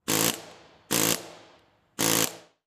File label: Industry Tool Air Hammer Run Multiple